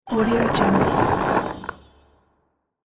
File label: magic beanstalk